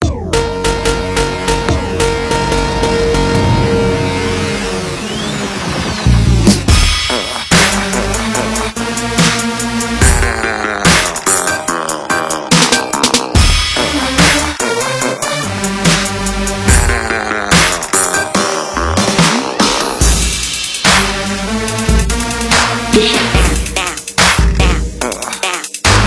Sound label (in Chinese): 鼓组; Battery4; Komplete